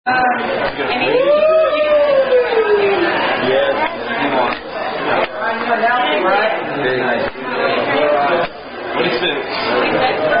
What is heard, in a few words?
Birthday, Red Robin Style, Happy Birthday, Tune Sound Off